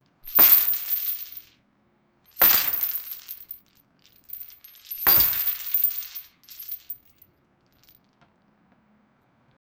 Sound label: swinging heavy chains